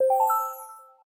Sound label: particle unlock, phonetones, ui; LG Optimus G F180K